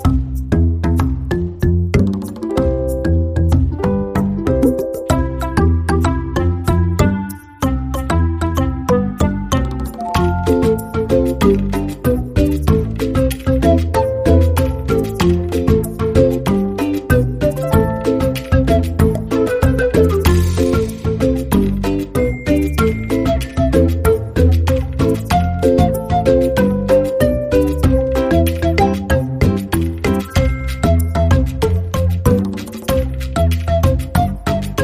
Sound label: Music; JetTank